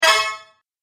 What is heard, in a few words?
Fast Entrance Sound, video game